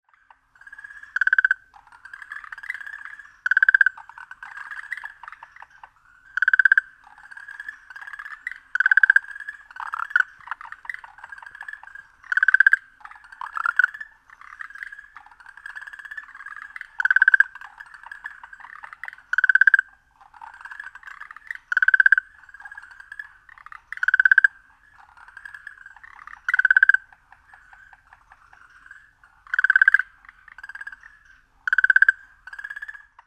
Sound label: okinawaaogaeru, frog